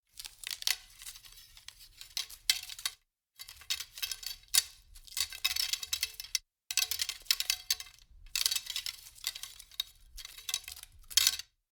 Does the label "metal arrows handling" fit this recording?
Yes